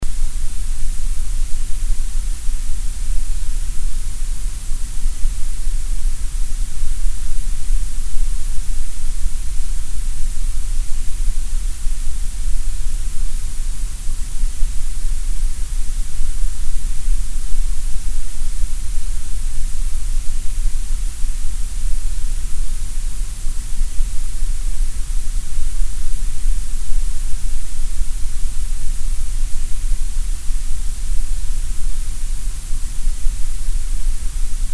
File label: Powerful Grey Noise; sleep calm chill noise meditation podcast